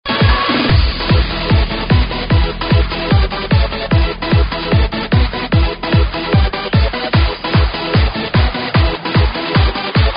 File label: kick; multitrax